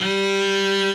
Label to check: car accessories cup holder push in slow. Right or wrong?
Wrong